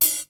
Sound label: natural cymbal sample f sharp key